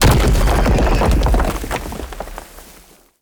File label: Octopede Unearth Proj By Explosion